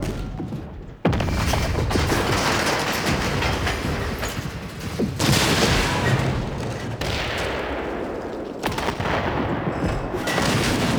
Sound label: dojo under attack loop; Mod Quest; Warframe; The Teacher